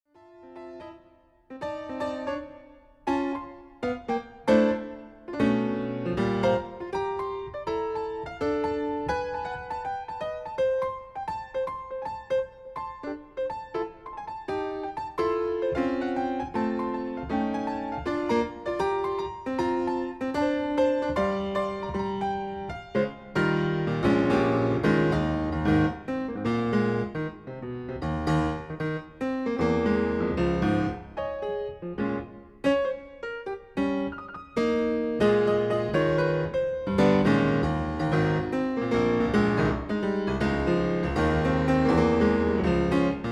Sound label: Jingle Bells